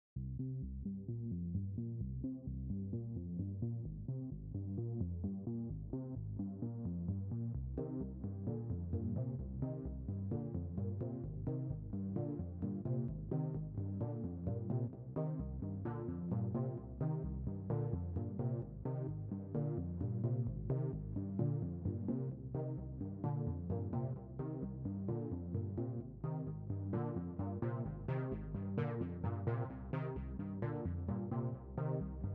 Synths

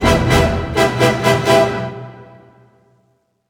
Commendation Music; Star Trek

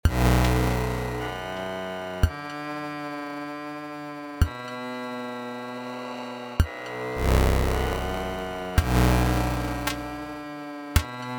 Battle Nature; inscryption

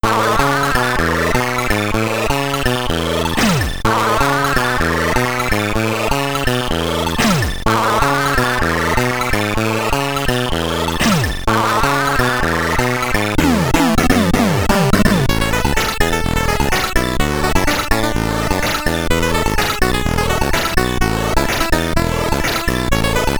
SID Stereo; Lungs; Gerry the Germ; commodore 64

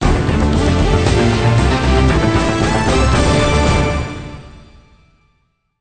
Sting Boss Defeated

